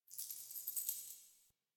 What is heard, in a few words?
Idle Head Shake; Ambience; Warframe; Entrati; Tagfer